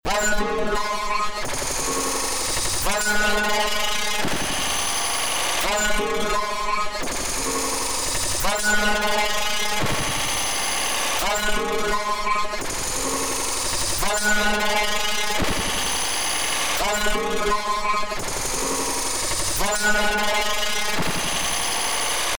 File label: toys